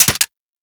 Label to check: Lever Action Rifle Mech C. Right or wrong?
Right